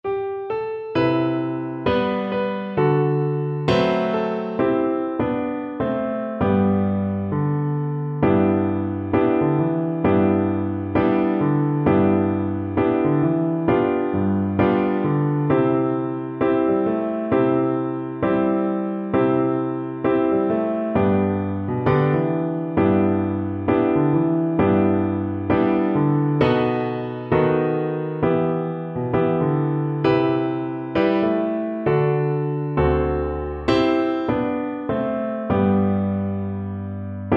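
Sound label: recorder karaoke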